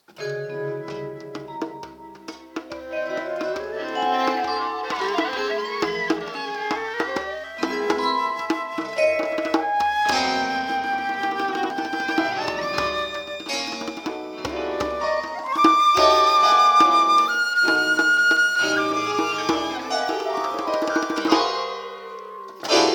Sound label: Ringtone; rehearsal